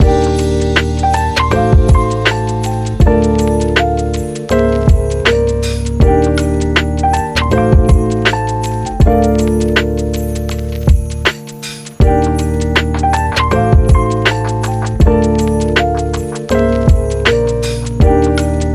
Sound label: christmas lofi chill